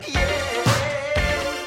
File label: yeayea